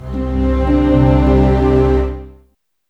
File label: CLOCK; East West; Scoring Tools; ORC; Clock Time